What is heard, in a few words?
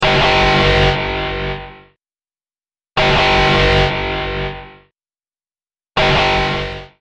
Sci Fi Alarm